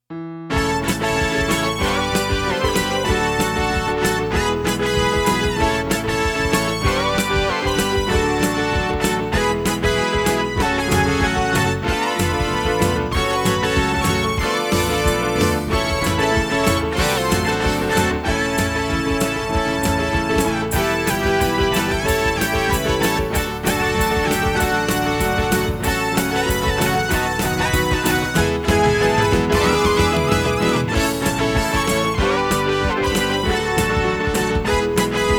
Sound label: dgx with drums